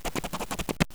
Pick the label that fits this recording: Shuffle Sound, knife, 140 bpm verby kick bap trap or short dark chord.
knife